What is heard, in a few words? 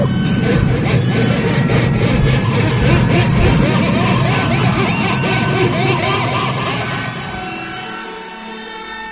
mega laugh; meebo